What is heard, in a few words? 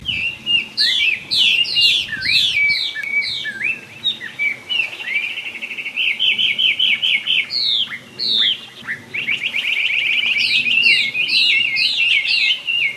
Taiwan Hwamei calls, Dongshi Taiwan